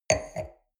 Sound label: wine glass place table soft; berufe; soundpool